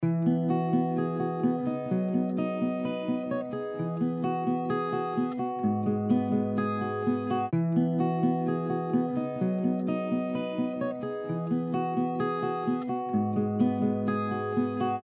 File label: stereo mod dry; multiphonics cv 2 audio fx; adam pietruszko; objeq